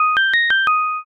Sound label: departure melody